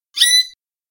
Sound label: iiwi call; Saddle Road Birding